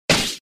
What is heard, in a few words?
Sfx Lancer Attack Hit